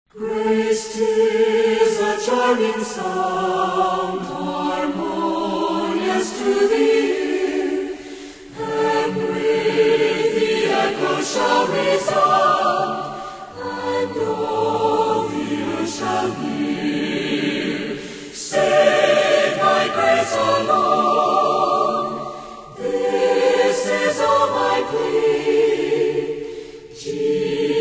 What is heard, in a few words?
Choir And Orchestra